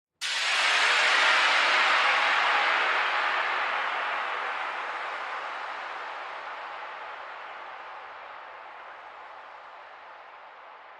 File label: Blasts Only